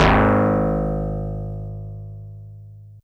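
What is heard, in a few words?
Easy Clav DX100 G#1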